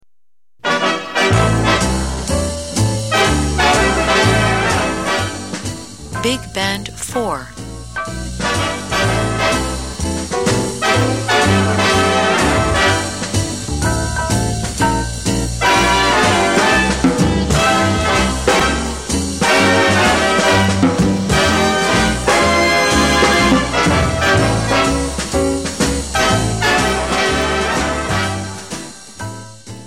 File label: bigband